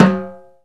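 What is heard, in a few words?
low timbale hand; E-MU Producer Series Vol. 2 – More Studio Essentials; Composer; Timbales Xswitch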